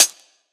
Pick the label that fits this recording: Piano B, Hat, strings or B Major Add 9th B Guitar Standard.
Hat